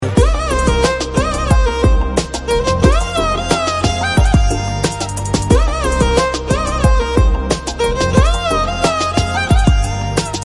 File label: Violin Ringtone